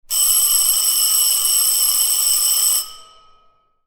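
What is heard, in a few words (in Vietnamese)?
Tiếng chuông reo đến giờ ra chơi trường học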